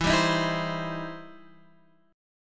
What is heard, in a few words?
E Minor Major 7th Sharp 5th E down; EmM7#5; strummed; JGuitar